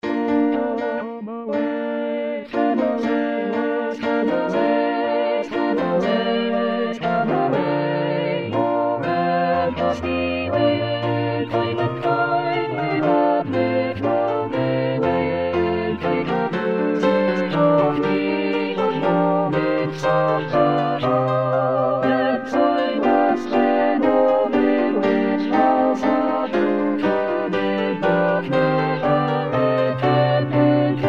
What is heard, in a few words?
sailors chorus